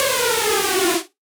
Screech FX - C#; House; Various FX

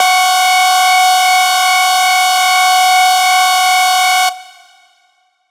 Riddim Melodic Hit - F#; Virus; One-Shots; Melodic Hits; Ghosthack; Riddim Essentials Volume 2

